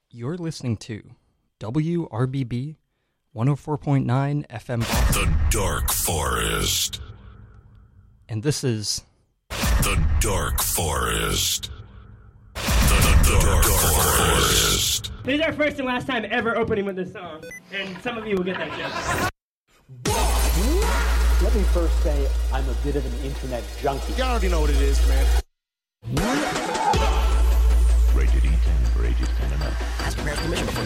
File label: mashcore